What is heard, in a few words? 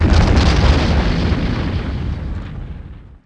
BLAST; ImpGal2; groundwar